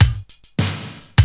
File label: loop, Roland; Sound & Vision - Gigapack I